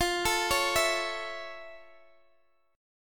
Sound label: A Major F; arpeggiated